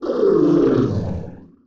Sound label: Temple Ogre death; Arenill